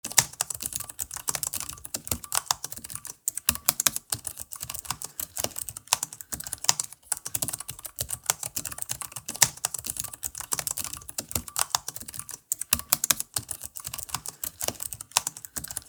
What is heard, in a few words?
Cherry ULP Click